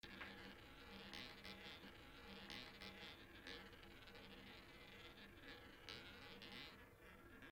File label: Ball Roll Track, Roulette wheel; Real Dealer European Roulette